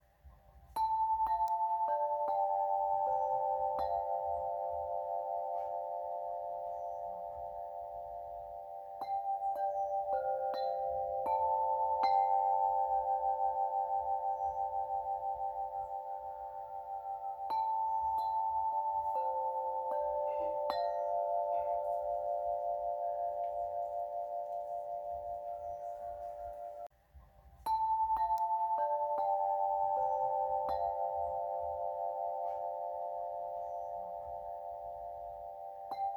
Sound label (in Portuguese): Pentatonica Suspensa